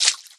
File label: footstep water; Scrat